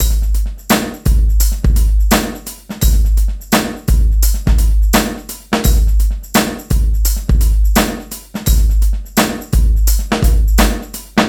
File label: DRY LOOP 85